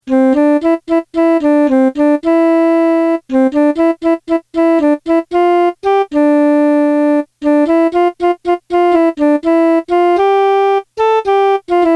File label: melody